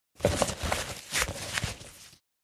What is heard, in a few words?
Gas mask wearing; military equipment sound effects